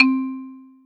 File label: Kawai XD-5 Xylophone C5